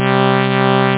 Flange; MarcDrive

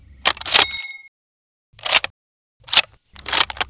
lee enfield reload, weapons foley; Spearhead Demo

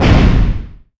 metal thump; The Sum of All Fears

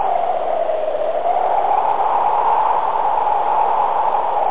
Wind blowing, Weather

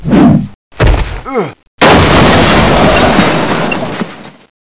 thud

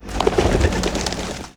doves; wob2 demo

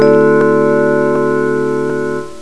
2 BELL

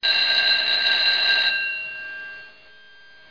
schoolbell; 11,127 sample rate; 3 seconds; bigtop; kitty2

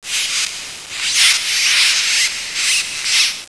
SEWER; Renaissance

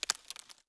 twigs; The Sum of All Fears

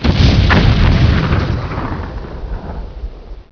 EXPLO; MPG-Net (USA); garden

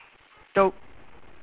Prospector Sad; Imperialism

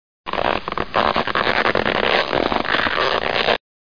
Sound balloon

